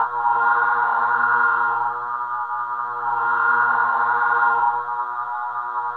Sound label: EASTERN VIB, TEXTURES; ILIO; Trance Fusion